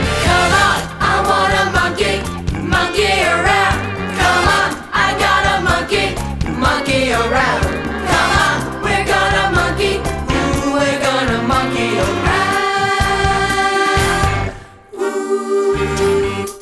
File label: callback vocal